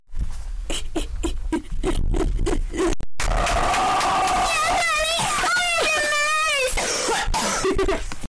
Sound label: techno clapping